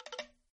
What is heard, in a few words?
checkpoint; free rider hd